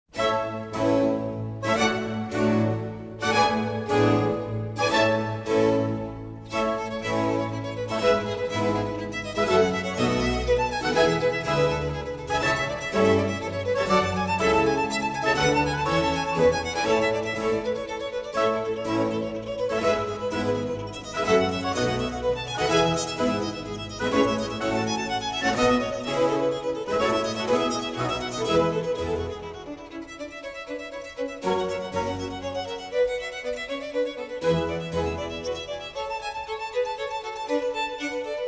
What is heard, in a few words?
Concerto Grosso in B flat major, Vivace; Georg Friedrich Händel; English Baroque Soloists, Sir John Eliot Gardiner; Concerti Grossi, Op. 3